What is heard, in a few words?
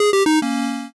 jingle bad